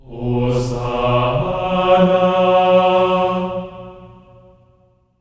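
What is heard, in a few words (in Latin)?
hosanna B3; Spectrasonics; Symphony of Voices; GR HOSANA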